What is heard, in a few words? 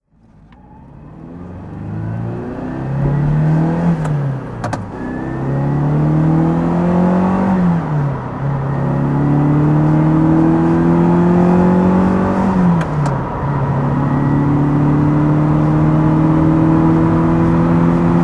ferrari 308 QV driving